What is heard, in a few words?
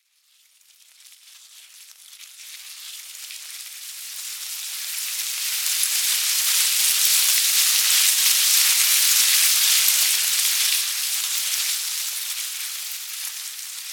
Ant Colony, Animal, Ant, Colony, Movement, Insects, Bugs; Herd, Approach, Leave, Army; 5.1; Surround Sound; BlastWave